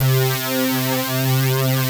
FAT ATTACK, DANCE; Pro-Rec

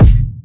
boomy kick

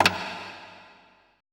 E LOG SLP, ETH DRUMS

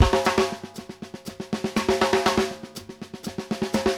DYNAMIC FI, TRAIN 120; Sampleheads; New York City Drumworks VOL-1

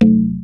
TALKING DR, Techno Trance; E-MU Formula 4000 Series Vol. 2

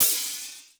Korg 01W MegaDrums; 300 Drum Machines

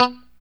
POP B3, STRAT guitar; Spectrasonics; Hans Zimmer Guitars VOL-1; JC-STRAT POP

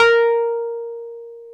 PNO, Roland Keys of the 60s and 70s, Wurly

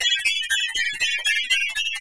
120BPM SWING; Bolder Sounds - Granular

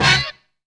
CRANKIN HIT; Zero-G; Funky Elements

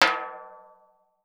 TIMBALE H, LATIN percussion; Voice Crystal - Steve Reid Definitive Percussion; LATIN SET

